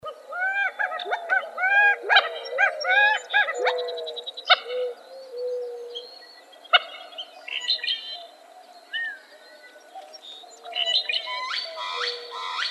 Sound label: GAMQUAIL; deviche